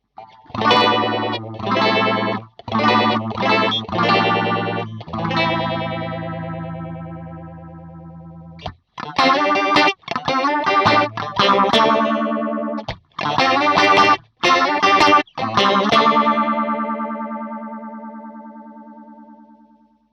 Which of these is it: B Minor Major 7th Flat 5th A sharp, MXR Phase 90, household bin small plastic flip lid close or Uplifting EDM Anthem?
MXR Phase 90